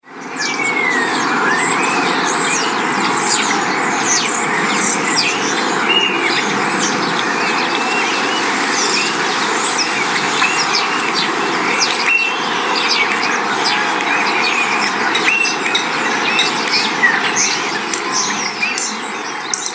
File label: Bird Song In Spring Coastal Town Ambience